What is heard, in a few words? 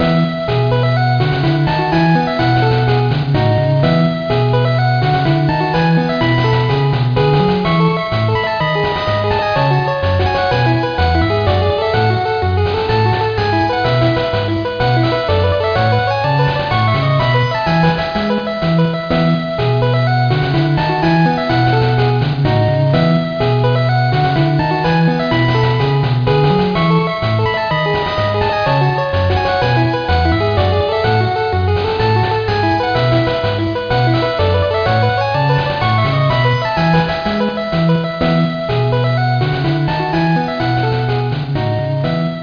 8bit; Pokémon GO